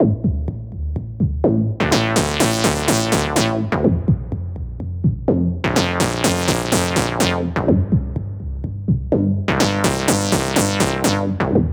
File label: Minimal Kick 125bpm